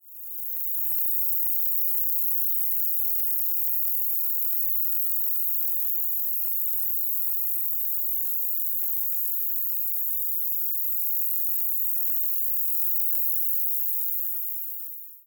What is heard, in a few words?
Beameria venosa filtered, cicadas